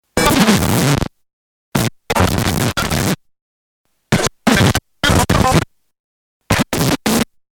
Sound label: Vinyl Scratches, Turntable stylus jumping and scratching a vinyl record.; Miscellaneous Electronic